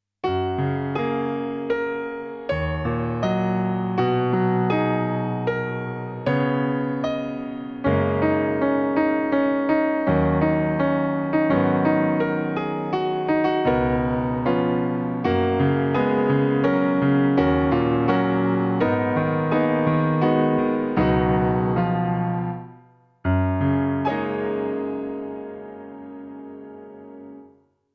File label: karaoke